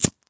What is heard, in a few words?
Lighter Flick; ModeAudio Found Percussion Hits